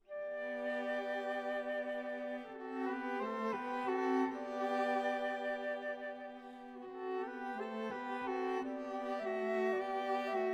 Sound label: flower duet